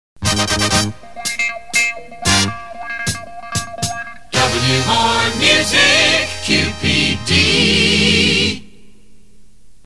jingle More Music; WQPD

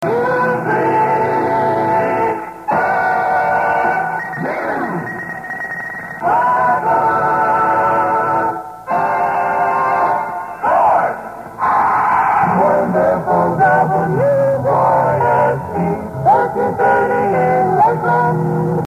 jingle; WYSE

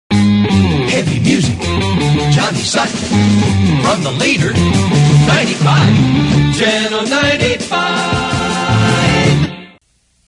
jingle; WLOF; 1969

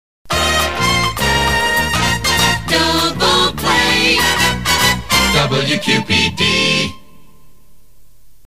jingle Double Play; WQPD

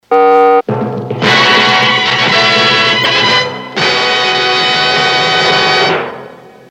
News sounder; WQYK; Mutual

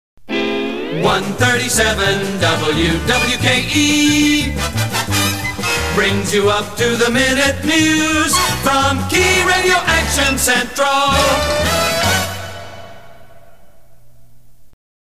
jingle Up to the Minute News; WWKE

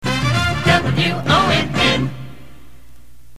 jingle; WONN

